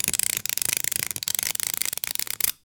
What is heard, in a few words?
screws tightening with, ratcheting